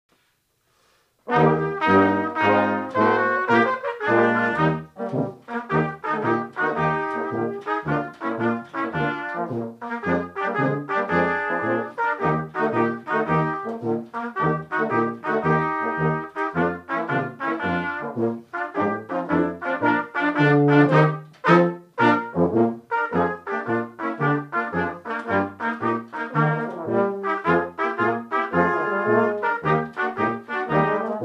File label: German March, Brass